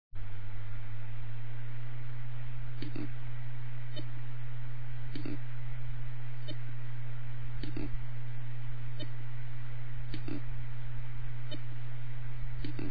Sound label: seagate slow clicking